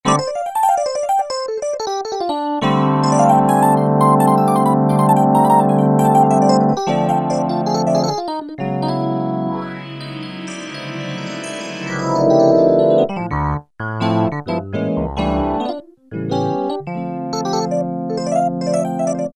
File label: Hot Jazz, E-mu Morpheus; Factory Presets